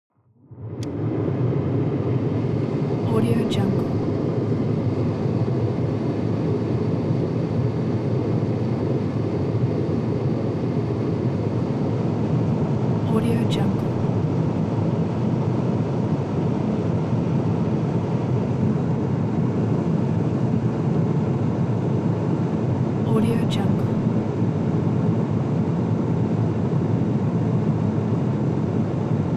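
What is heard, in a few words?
transportation sports car interior ventilation hard long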